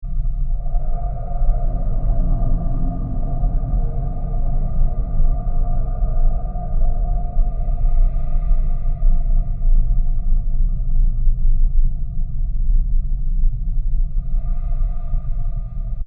Deep Spacy Ambience With Wobbling Bass Whooshes And Other Objects, 120bpm; Crafted Signals